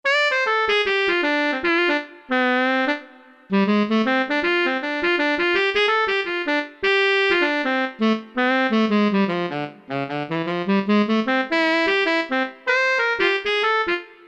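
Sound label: reed tenor sax; E-mu Proteus FX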